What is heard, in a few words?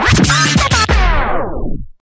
record scratch